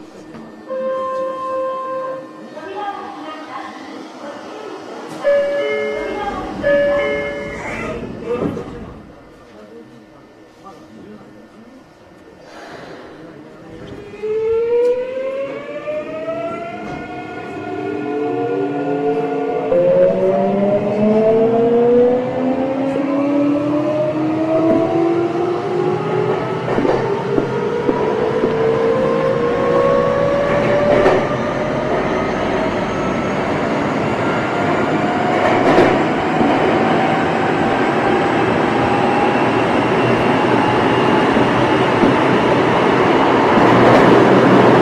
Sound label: vvvf, mitsubishi; eidan